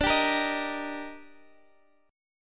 D sharp Major D, chord; D#/D strummed

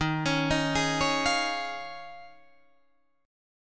Eb 9th Suspended 4th Eb, Guitar DADGAD; Eb9sus4; arpeggiated

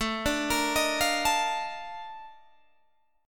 A sharp Major 11th A

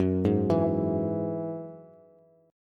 Gb 7th Suspended 2nd Gb Bass; arpeggiated; JGuitar

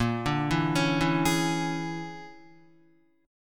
A sharp 7th Sharp 9th Flat 5th, down, Guitar; arpeggiated; chord; JGuitar

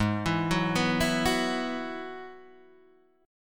Ab Minor 7th Sharp 5th Ab, down, Guitar, DADGAD

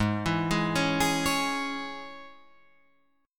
Ab Suspended 4th Sharp 5th, Guitar Open F; Absus4#5 arpeggiated; JGuitar

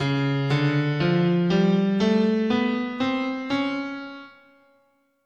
Db Enigmatic, scale

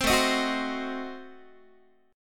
A Diminished 7th A down Guitar; strummed; Adim7; 15,17,18,16,16,x

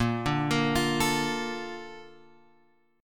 A sharp Major 7th, Guitar Open G

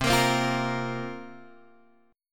C 7th Suspended 4th, Guitar, Open G

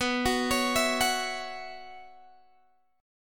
B Suspended 2nd Suspended 4th, Guitar, Open G

